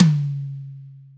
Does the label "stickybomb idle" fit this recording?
No